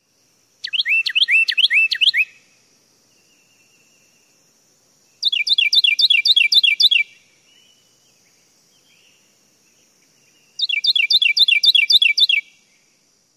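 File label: Carolina Wren song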